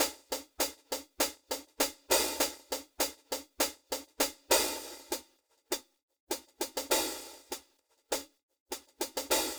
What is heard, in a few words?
Wave Drum SEP HH; Decomp Mag Mix Phase GL